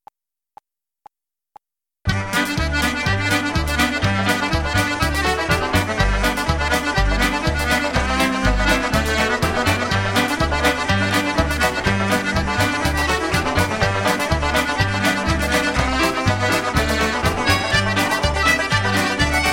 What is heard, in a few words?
Traditional Irish Reel G; backing track